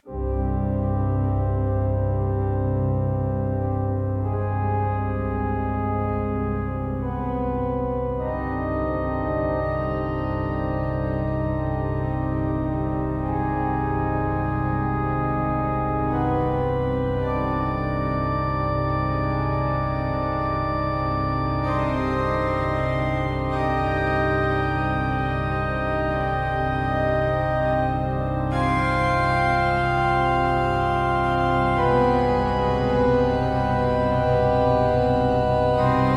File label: spiritual spooky soundscapes